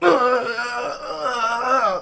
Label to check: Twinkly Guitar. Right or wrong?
Wrong